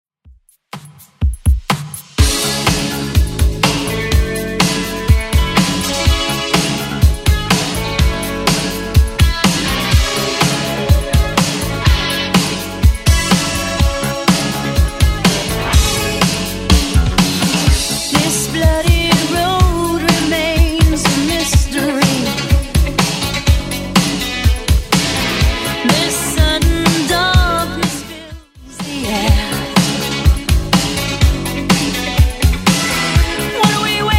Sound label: 80s redrum 124 bpm